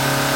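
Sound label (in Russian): звонкий оглушительный набирающий обороты звук мотора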